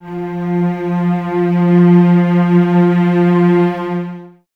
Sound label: Cellos F 3 Expressivo; Miroslav Vitous; String Ensembles